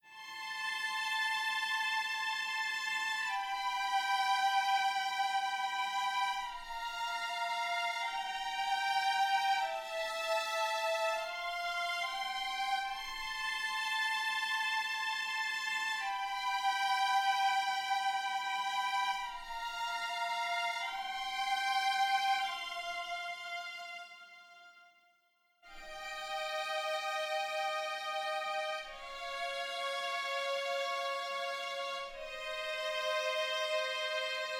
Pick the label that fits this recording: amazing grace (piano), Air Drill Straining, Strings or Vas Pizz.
Strings